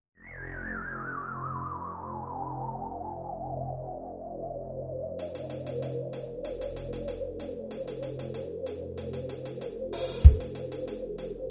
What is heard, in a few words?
Karaoke